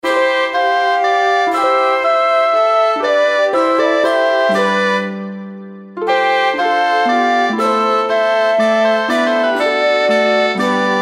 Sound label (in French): OCARINA DE LA COUR